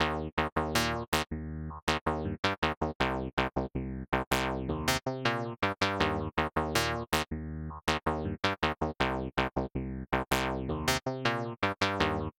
raw loop

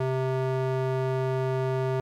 base wave

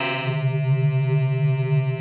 metal attack

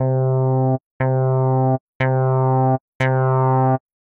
filter picked

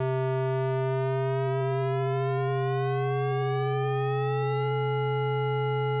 triangle bent

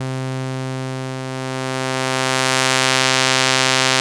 saw clipped

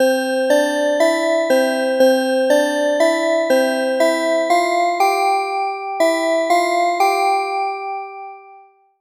osc octave

